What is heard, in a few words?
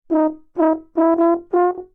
Singing Trombone; Inspired by Nature by Dillon Bastan; Vector Grain Presets